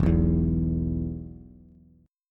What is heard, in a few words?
E 5th, Bass; strummed; JGuitar